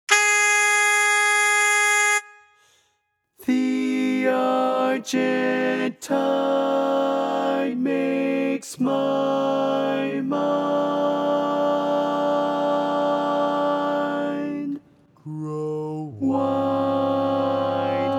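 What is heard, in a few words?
Baritone, barbershop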